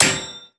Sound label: frag physics bounce metalgrate hard; gmod; viper